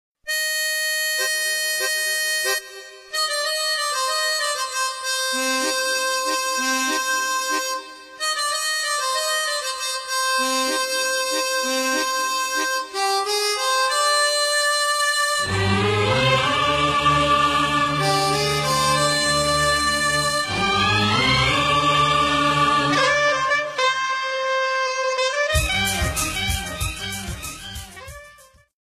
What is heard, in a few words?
kannada movie ringtone; preethse; upendra